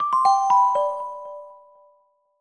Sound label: Music Bell, notifications; Vivo X1